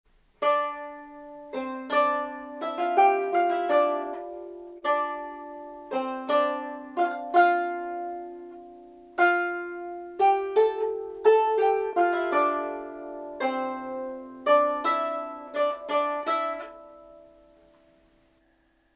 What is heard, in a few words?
Octaves